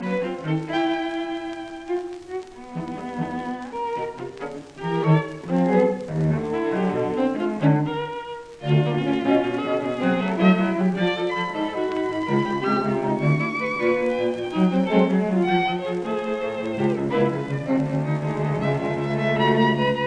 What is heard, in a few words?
String Quartet Intermezzo Allegro moderato; Schoenberg